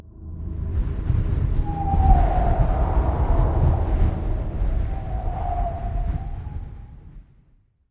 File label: heavy wind cast